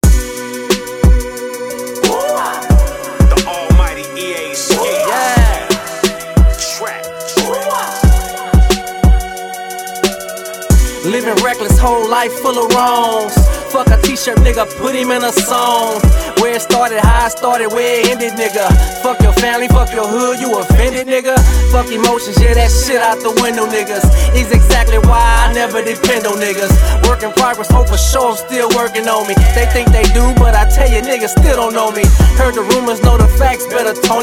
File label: Ooooohaaah